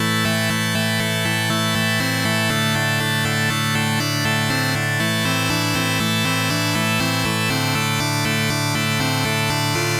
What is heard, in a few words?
Synth Loop 120 Bpm